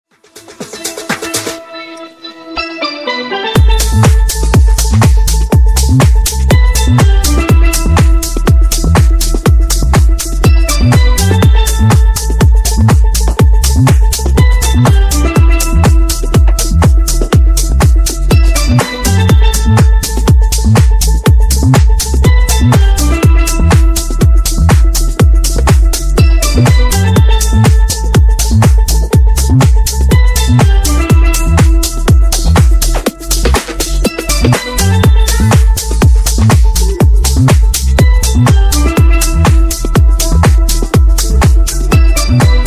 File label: Funk 122Bpm